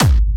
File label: Harder, kicks